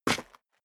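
step sneaker concrete grit single right, footsteps; schritte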